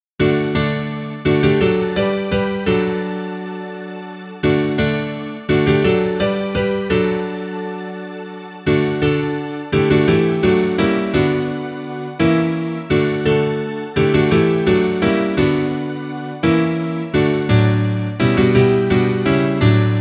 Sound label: instrumental